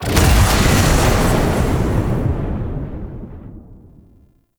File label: Hand Fire, Weapons; Warframe; Duviri